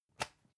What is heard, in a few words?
usb port metallic out hard